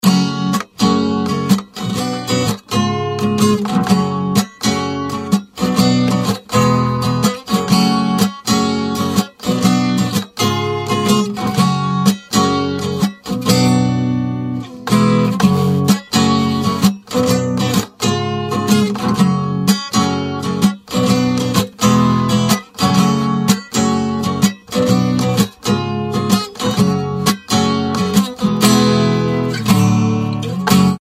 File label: guitar